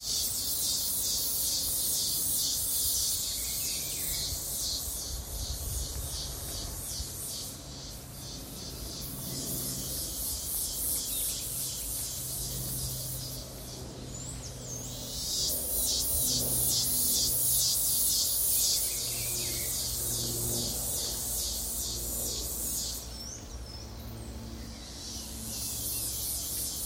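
chorus of cicadas recorded in Asuncion nature park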